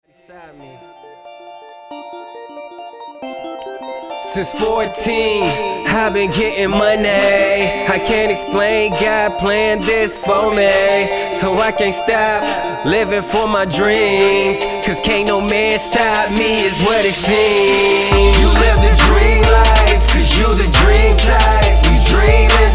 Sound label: new rap songs